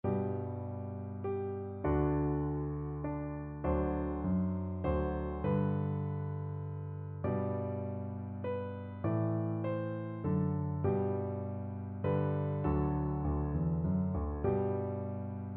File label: Kyrie Eleison, piano duet